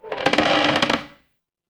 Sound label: door creak